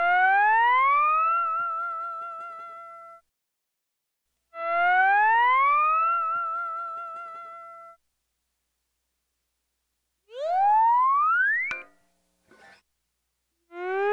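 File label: Sunrise Guitar Fx F# Dry, Lounge; Zero-G Kocktail Kollection; Construction Kitz (Lounge); Sunrise Mixes 082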